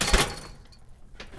chainlink, Players

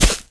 grass, Players

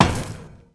ladder, Players

